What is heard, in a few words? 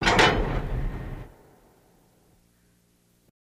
Train Couple X1, couplers